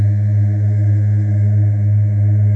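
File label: atmosphere; Zero G; Man Machine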